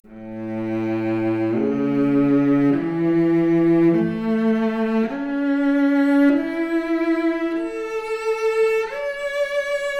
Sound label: Violoncello arp, Stereo; 4bit